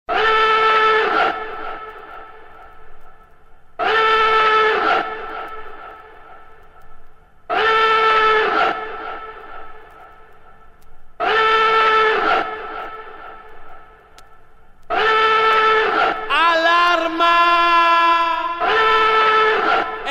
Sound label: alarma acapella